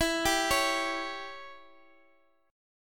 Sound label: E Minor 6th E, Guitar Open F; Em6; arpeggiated; x,x,x,11,10,8